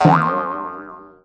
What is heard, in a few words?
sproing; curiousgeorge